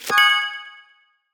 flipcard open; pinball; Gacha

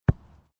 Ball Hit Ground; pinball

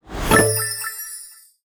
luckywheel show; pinball; Gacha

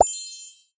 lucky wheel collect; pinball; Gacha

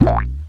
slingshot; pinball; Chocolate

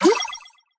booster; pinball

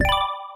hit bone; pinball

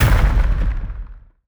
hit fall; GadGame; smart interactive display

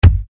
Ball Hit Player; pinball

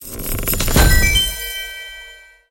rewardchest; pinball; Gacha

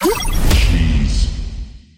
booster; pinball

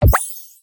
lucky wheel button; pinball; Gacha

